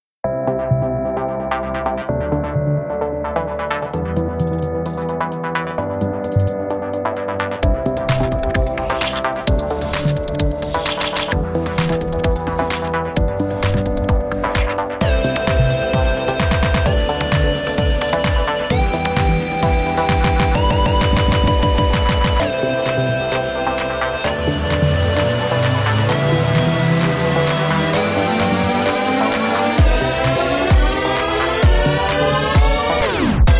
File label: EDM